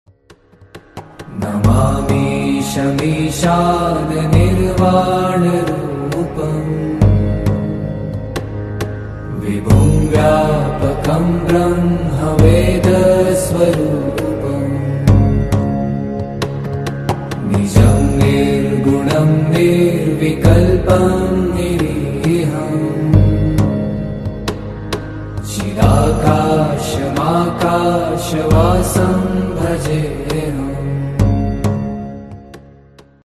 namami shamishan ringtone mahadev ringtone; mahakal

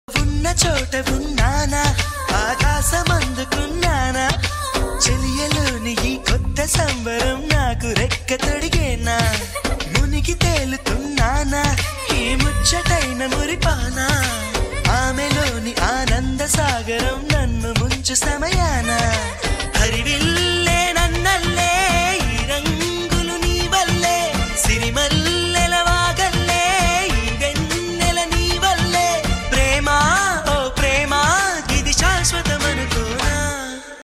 telugu love songs

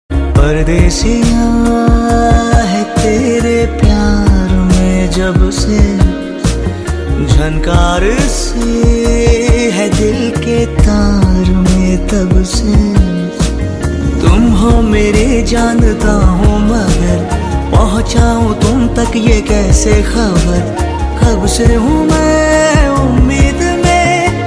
ringtone song ringtone

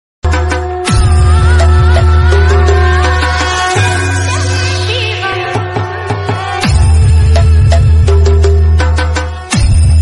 remix ringtone dj ringtone; radhe krishna

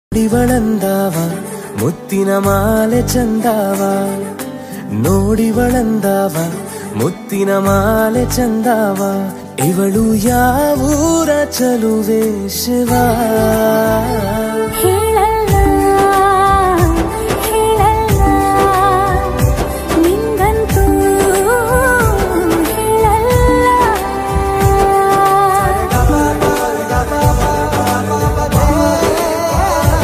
romantic song kannada ringtone

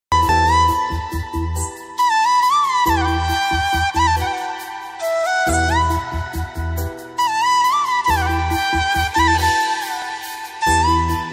heart touching bansuri ringtone

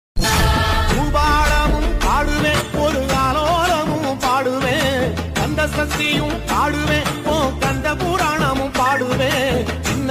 tamil murugan devotional; lord murugan

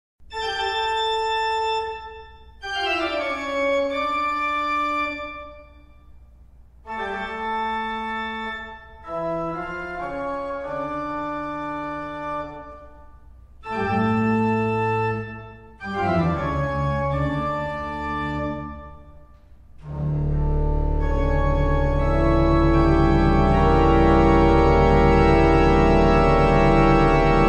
toccata and fugue in d minor ringtone

